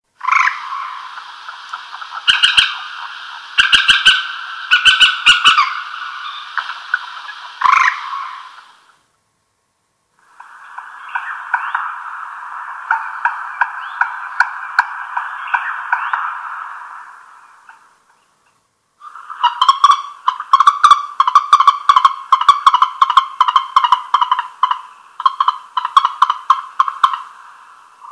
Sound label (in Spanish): polla de agua